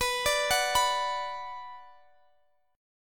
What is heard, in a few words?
B Minor, Guitar, Open D